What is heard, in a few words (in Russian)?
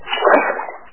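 вода одиночный близкий шумный всплеск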